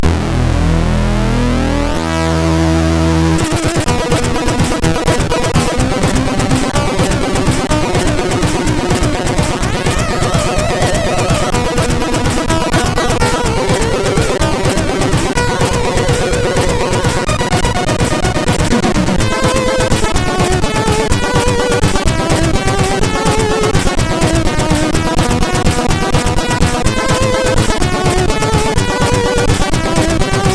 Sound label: Metal Warrior 2, CSG8580R5